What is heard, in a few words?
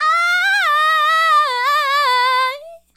Adlib, Hip-Hop and RnB Vocals; Sonic Foundry